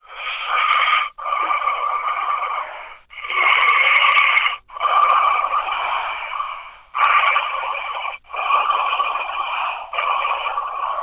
human breathing heavy down phone recorded receiver